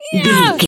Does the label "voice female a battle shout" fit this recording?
Yes